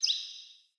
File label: Bird Single Chirp; Paragon Bird